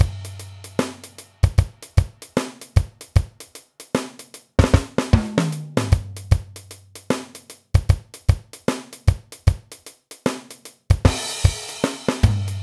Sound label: Up Beat